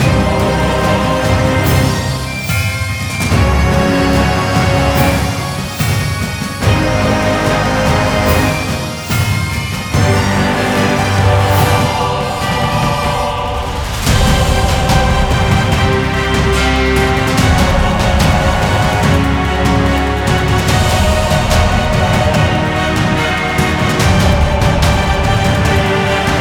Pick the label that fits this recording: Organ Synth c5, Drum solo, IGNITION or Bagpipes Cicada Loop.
Bagpipes Cicada Loop